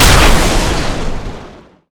railgun blast; Quake 4 Alpha; id software; strogg; tik

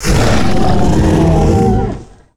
rush; Quake 4 Alpha; strogg tik

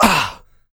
pain light, player; Doom

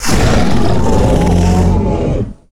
rush; Quake 4 Alpha; id software; strogg; tik

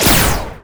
flyer shot; Quake 4 Alpha

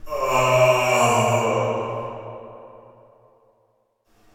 prison pain; Quake 4 Alpha

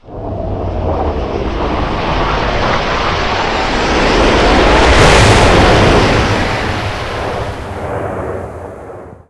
big gun miss; Quake 4 Alpha

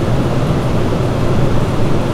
cryo lab; Quake 4 Alpha; ambience cryo

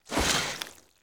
pinky drags body; DoomRTX

